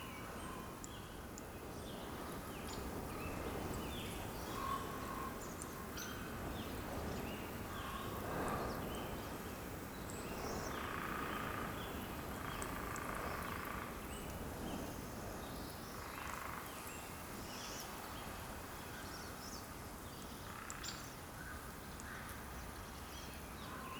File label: ambience coniferous forest day breeze dry; Rust